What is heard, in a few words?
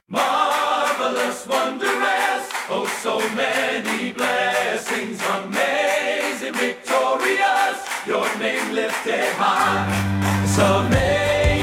Pounding Folk Horns